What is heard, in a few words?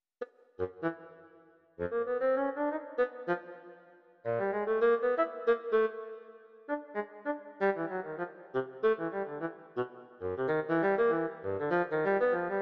for bassoon presto